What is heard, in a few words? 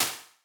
impulse response